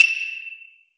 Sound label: Hyoushigi, Roland BK-5 Percussion